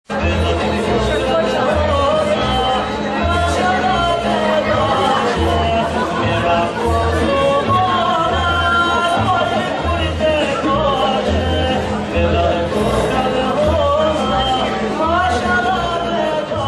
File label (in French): Zenska Siptarska Igra, Albanie